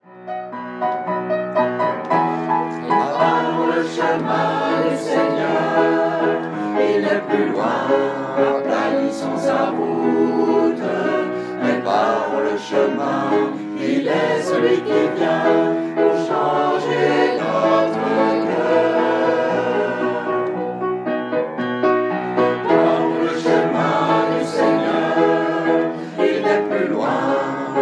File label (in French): chant